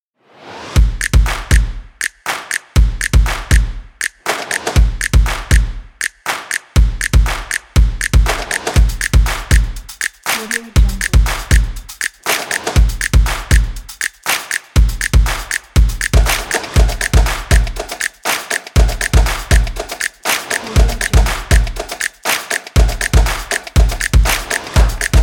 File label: drums and claps